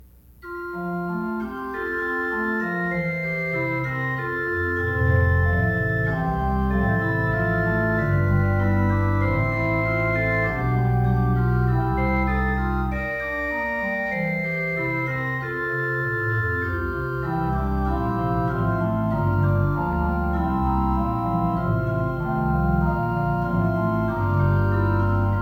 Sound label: Orgelbuchlein BWV 632; Herr Jesu Christ dich zu uns wend; Bach